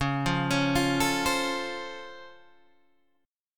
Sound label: C sharp Augmented Major 7th C sharp Guitar Open G; down; chord shape